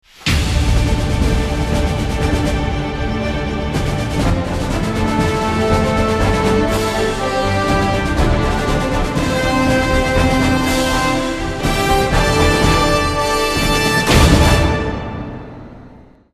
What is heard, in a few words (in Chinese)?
片头音乐